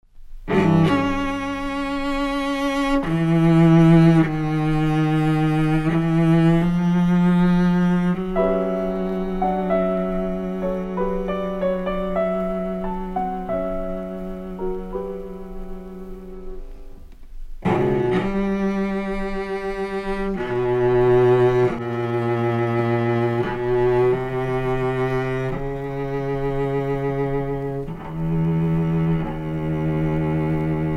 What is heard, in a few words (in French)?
Sonate pour violoncelle et piano en si mineur Allegro; VIERNE; op. 27